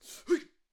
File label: Taekwondo Attack Vocals